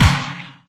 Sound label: Percussive, Percussion; Vengeance Samples